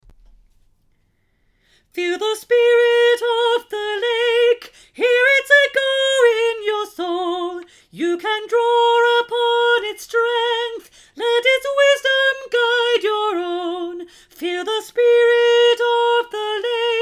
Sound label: Alto Round